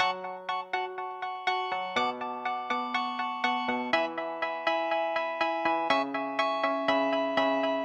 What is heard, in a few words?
piano loop